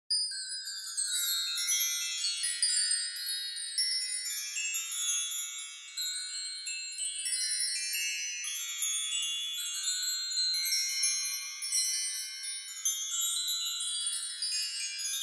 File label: Wind Chimes Long